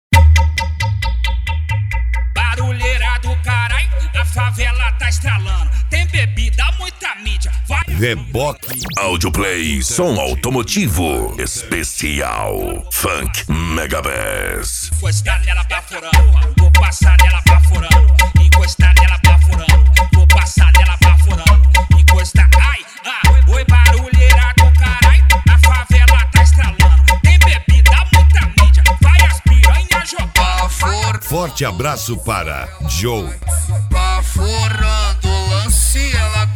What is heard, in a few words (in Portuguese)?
esp funk mega bass